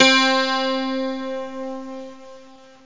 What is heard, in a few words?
bazuka, keyboards; Zoom - Release 2; Active Software